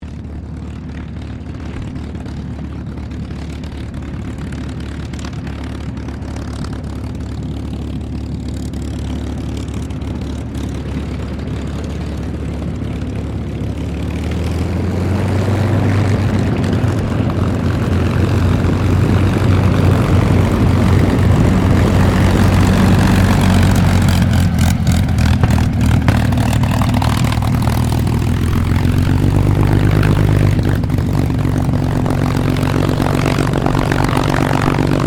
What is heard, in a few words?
AIRCRAFT CAC CA-18 MUSTANG MK23 TAXI SHUTDOWN; CA-18 /P-51 Mustang; Airplanes (Sound Effects:Vehicles)